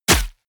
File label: bullet large impact body